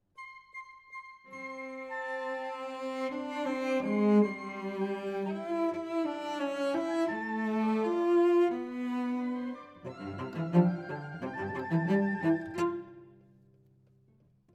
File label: Cello Solo